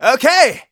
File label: positive vocalization; Scout; "Okay!"